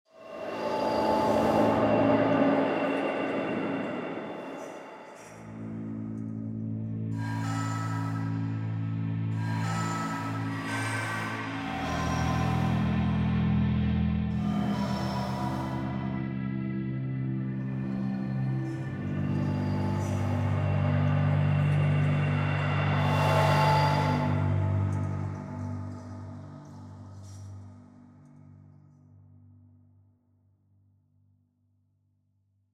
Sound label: clashing rails, NOISY DARKNESS; The Sounds of big locomotives grinding over the tracks, wagons jumping over switches and s; CINEMATIQUE; KLANG